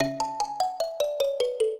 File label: minuet, mbira; Mozart